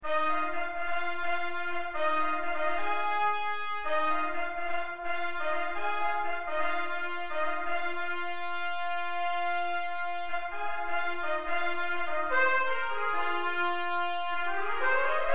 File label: Trumpets